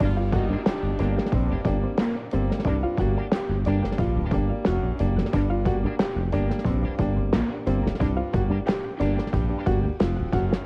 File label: bgm result; pinball